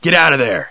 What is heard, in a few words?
run; jc denton